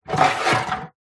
household sliding wooden toilet roll holder lift up